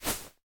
Footstep Beanbag

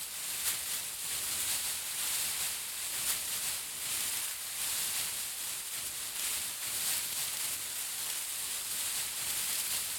Footstep Foliage Bush Big Loop

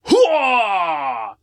Burstout; Dung Def

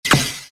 shot ice pop

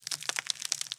Damned Tourment Skull

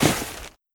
Cloth; Scum Cloth4

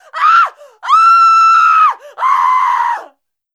Hurt

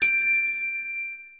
Ui Gem bundle Click; Superb; Nickelodeon Super Brawl Universe; Android

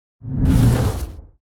Flag Wave; Monster Jam Steel Titans

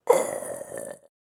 Death

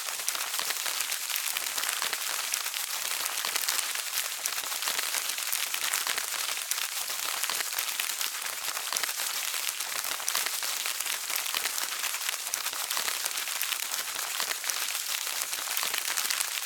Crayon Tree Rustle Loop

